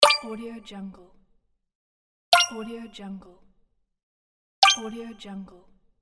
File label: quirky coin; game